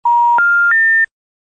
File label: invalid tone, phone